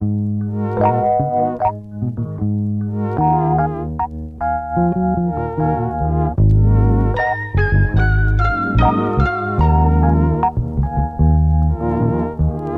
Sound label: keys